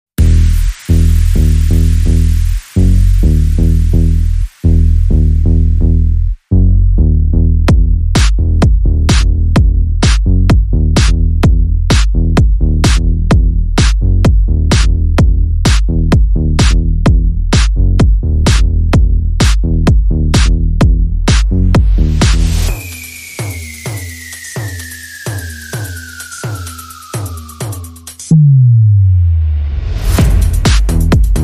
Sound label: Drums Bass and SFX Only